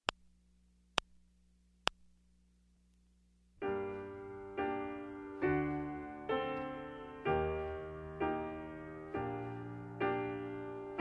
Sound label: Largo Accompaniment Only; Eccles; Sing Along 4